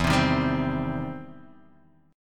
E Minor Major 7th Sharp 5th, E, down, Guitar, DADGAD